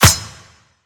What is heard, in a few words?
impact blade; Smite; Cabrakan